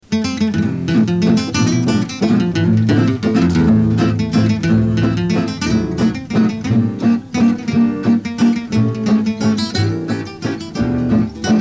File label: Valse